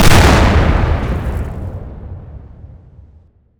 explode

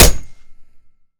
golf hit, golf club; weapons; melee

